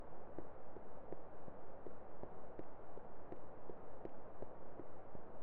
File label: Surface glass